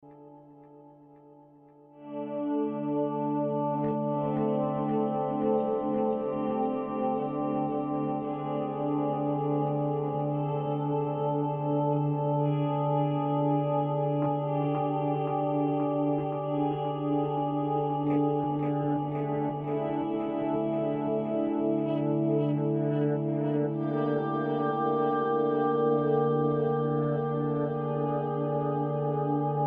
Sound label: Zoom Delay Pad